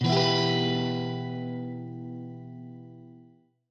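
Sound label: E min, Guitar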